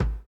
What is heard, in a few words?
kick, house